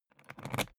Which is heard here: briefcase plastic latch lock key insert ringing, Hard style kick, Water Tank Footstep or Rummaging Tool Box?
briefcase plastic latch lock key insert ringing